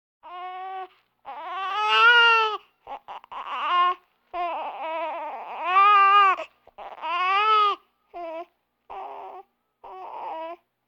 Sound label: Vocal effects breathing Moaning Baby